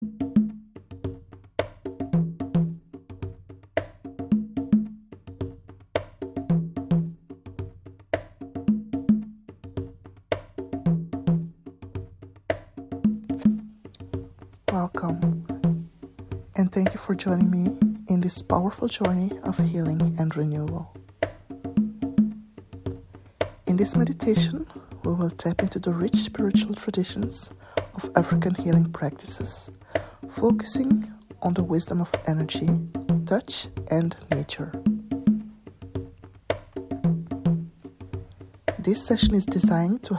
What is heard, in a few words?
African Energy Massage Meditation